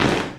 marching

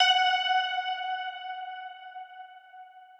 Crystal F#6 fil80

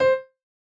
chorus C6

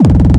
normal hit normal

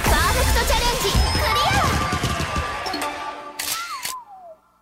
applause

